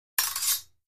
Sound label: Fencing; Swords Hit And Slides